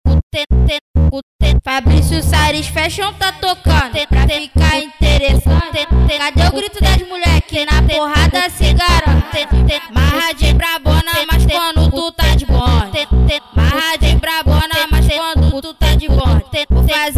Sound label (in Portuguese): funk e lambadao